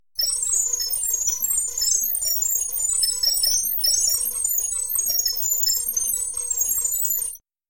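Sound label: electronic sounds of data transmission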